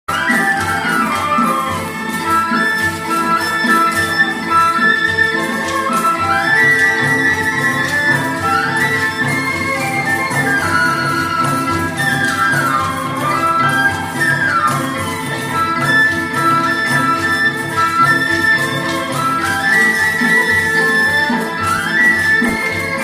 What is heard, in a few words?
Hanoi H2O Puppets